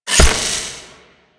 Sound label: Rain Of Arrows Impact; Warframe; Duviri; Duviri Dax; Abilities; Dax Archer